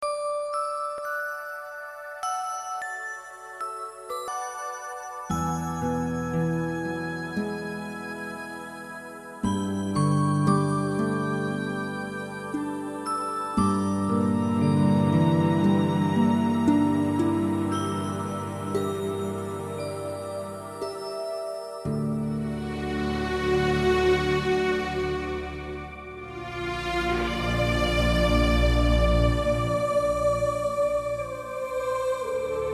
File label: Instrumental; Black (2005)